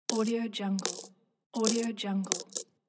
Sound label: coins falling money dropping; tomeqs